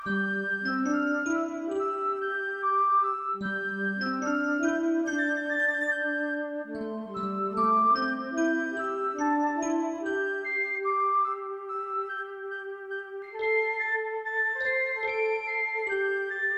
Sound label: pianto